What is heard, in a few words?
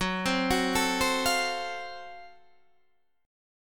F sharp Minor Major 7th Flat 5th F sharp down Guitar Open D